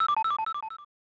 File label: Unknown Jingle; congo the movie the secret of zinj 1995 snes